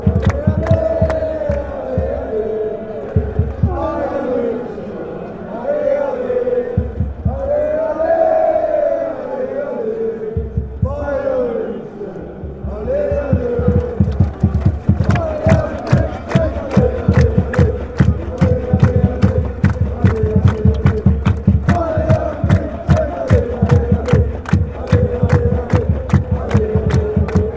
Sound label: allez fanchants; bayern muenchen